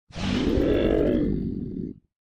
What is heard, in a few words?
Roar; Flarb